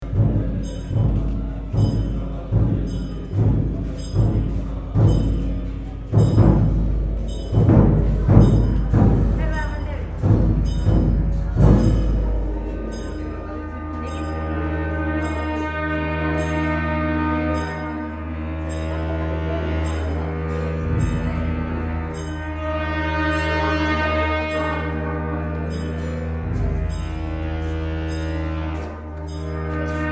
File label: long horns, nepal